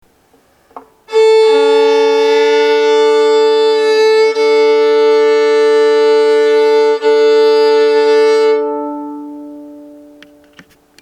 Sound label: tune D-A doublestop, violin